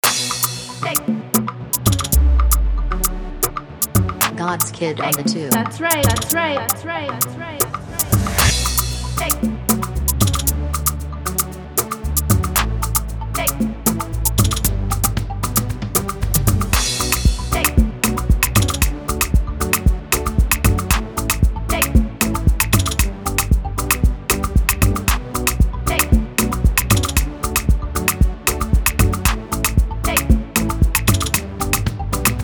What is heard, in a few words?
Type Beat